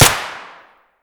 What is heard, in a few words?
gyrza indoor distant; Escape from Tarkov